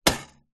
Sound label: household rice steamer switch